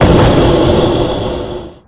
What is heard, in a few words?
buff darken